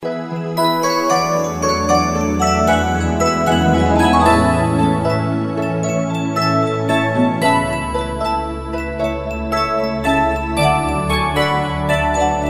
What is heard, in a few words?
music box; tsuyokiss